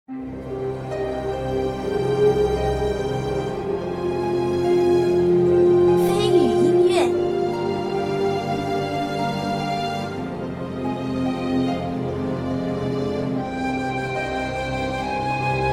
恢宏-大气-夜晚

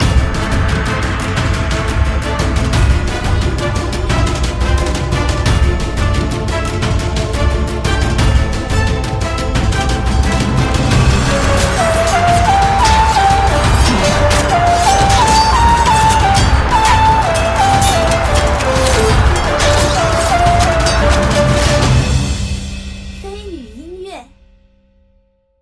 剧情音乐

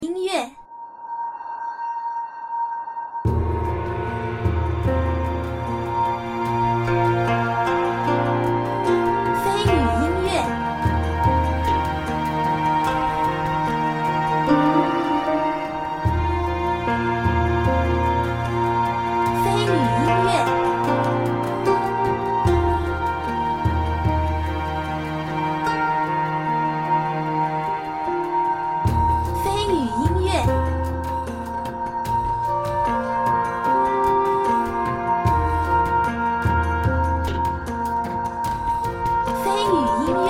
长安城-唯美-华丽

